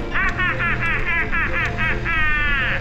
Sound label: laugh; circ